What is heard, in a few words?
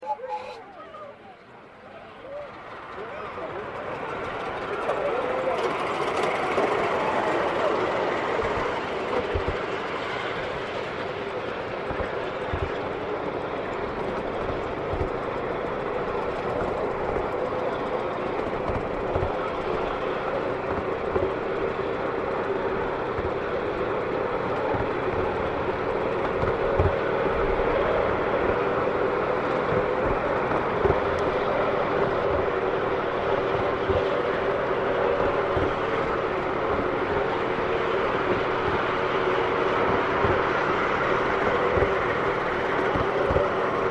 stereo steam train ride on model railway listen out for long whistle as we go under